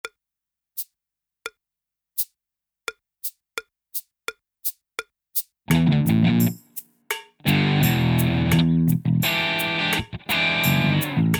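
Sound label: backing, drumming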